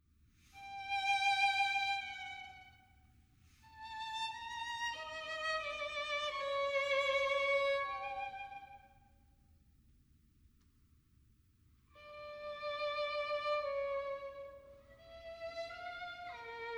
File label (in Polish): kwartet smyczkowy